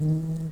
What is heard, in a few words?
Lapidarius workers, bumblebees